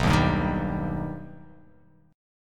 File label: B Augmented B down